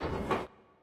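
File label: space power obelisk piston; smite; Anhur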